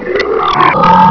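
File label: attack growl, bullchicken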